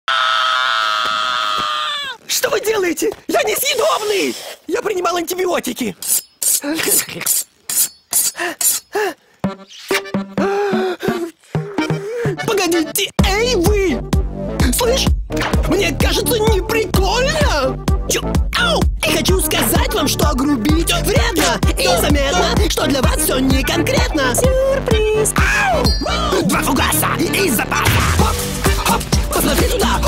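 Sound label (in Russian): pesni iz multfilmov; tri bogatirya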